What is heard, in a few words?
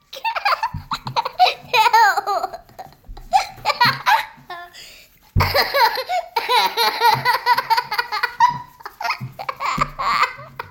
Laugh